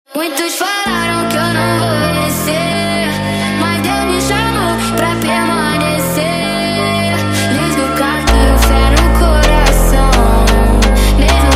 Montagem, Sped Up; فانک

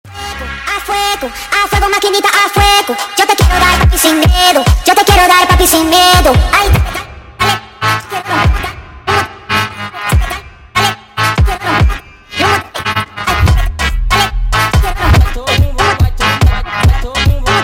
Funk Sped

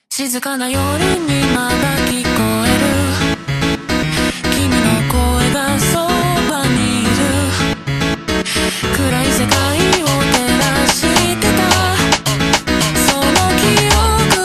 super Slowed, funk

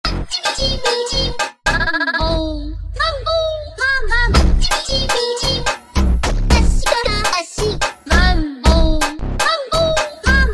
Funk super Slowed

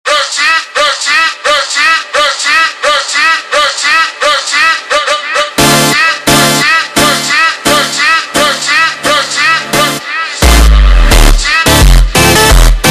ultra Slowed, funk

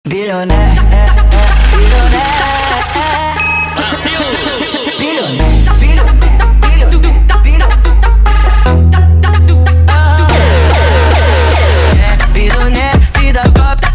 Phonk sped Up; funk

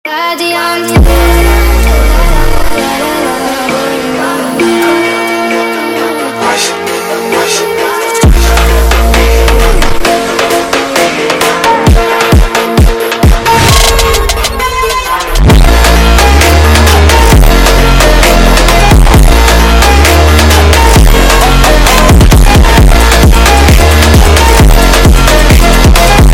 Funk Unico sped Up

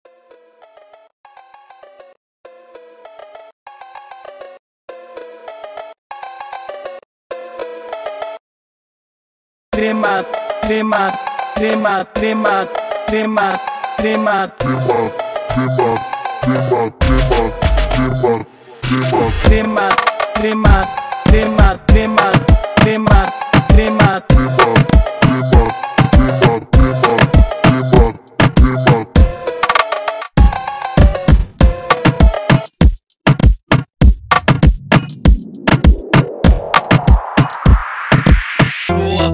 Funk extreme Slowed

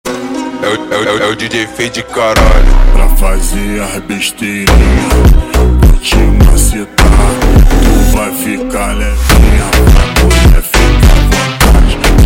Montagem slowed; فانک

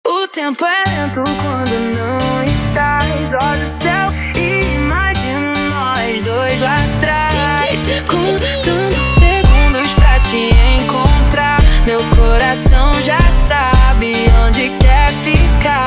Montagem slowed, funk

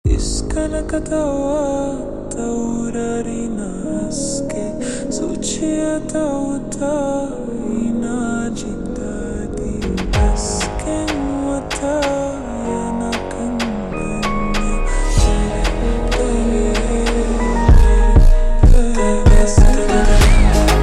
Funk ultra Slowed, funk

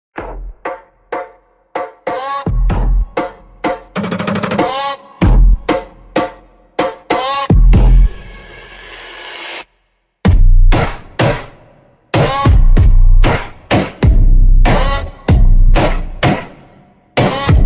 Airtel Funk super Slowed

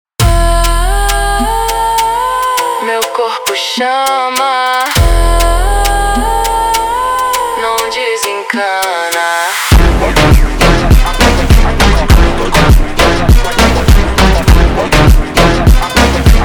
Montagem slowed, funk

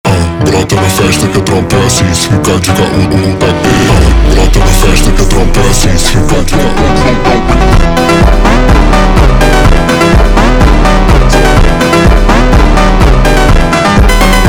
Funk, funk

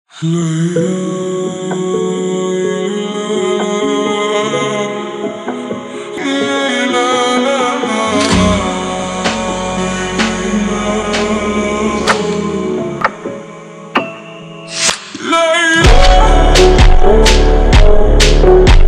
ultra Slowed, funk

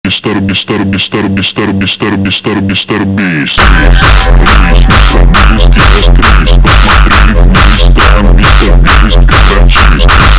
Hardtekk slowed Reverb

